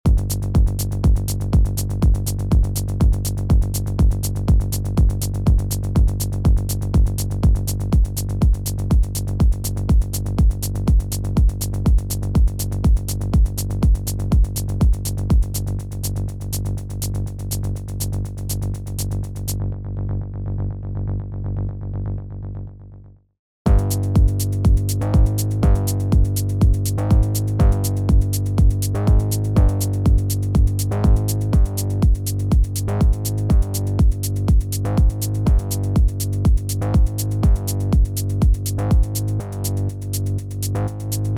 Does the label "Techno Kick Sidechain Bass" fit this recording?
Yes